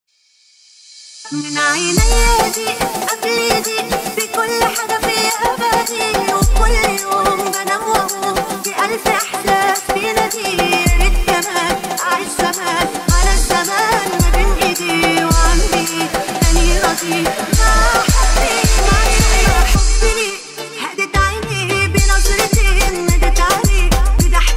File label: arabic remix